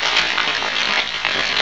gravel; stunt rally; surface

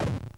shot hit; commander genius

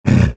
slime die; edgar; enemy

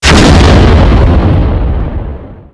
heavy rocket hit; endless sky

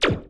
mod blaster; endless sky

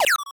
damage; project hawk

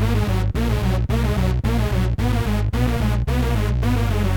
trouble loop; source of tales

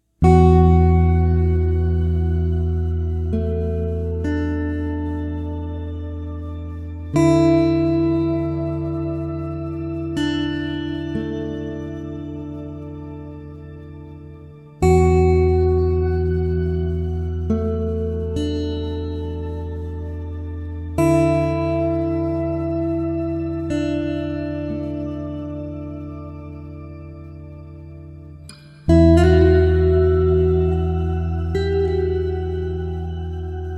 slow theme ambient guitar; source of tales

